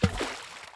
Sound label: spear water; Alexander